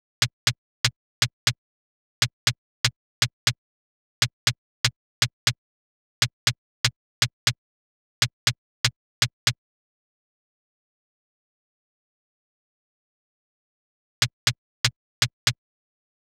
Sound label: Closed HH; hydrogen